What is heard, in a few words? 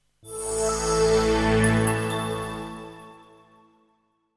Twinkling Swell A Major; Software Logos